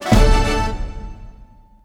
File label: jingle combo start; Seven Seas Solitaire